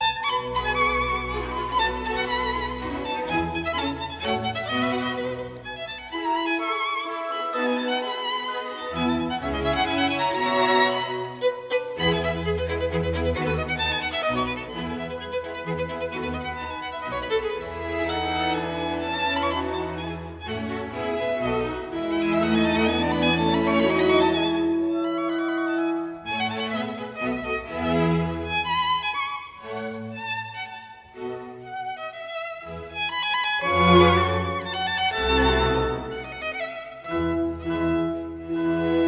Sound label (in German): violinkonzert nr 5 a dur kv 219 rondeau tempo di menuetto